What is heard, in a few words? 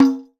Jing Drum; Multisamples; Digital Sound Factory Planet Earth